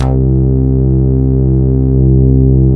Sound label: JV BASSIC; Syntec; Wall of Sounds